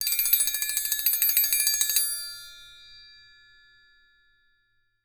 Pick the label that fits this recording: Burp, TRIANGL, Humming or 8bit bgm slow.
TRIANGL